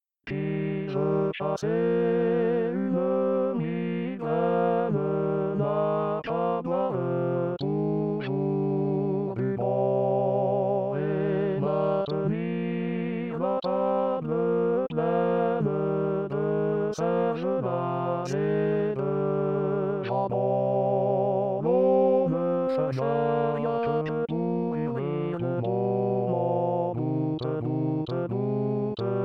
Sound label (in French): qui veut chasser une migraine satb chante 1 ton